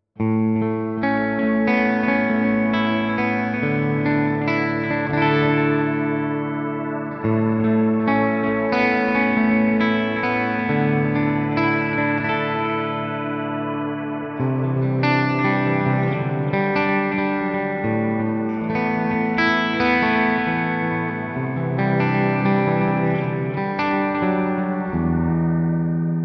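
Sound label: ambience reverb BBD delay; eleven rev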